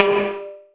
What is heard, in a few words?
Perc, Gated Reverb; musicradar